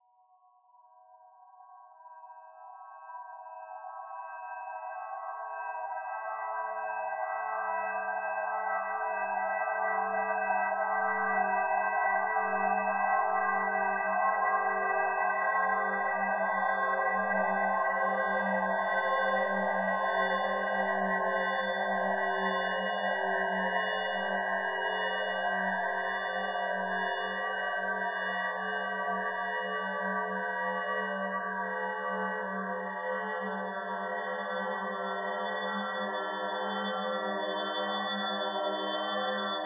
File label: rope spectral gate and hold; Women's Song with Spectral Gate & Hold; soundmagic spectral; Michael Norris